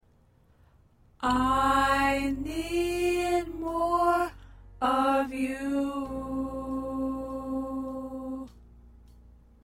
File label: Alto